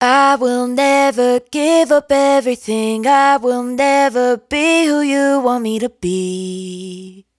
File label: hook Never Give Up Everything 160 F#m, Dry Vocals; Raw Vocals; Slate Digital Omnivox Vocal Sample Pack